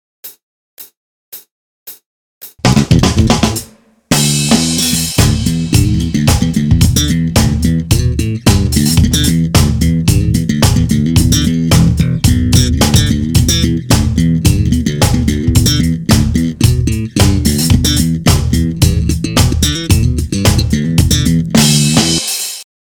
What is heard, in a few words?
Bass Dance slap groove